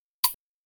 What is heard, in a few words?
Hi Hat